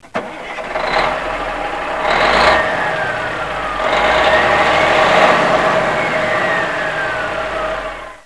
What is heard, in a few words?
bigrig ignition; Big rig ignition and revving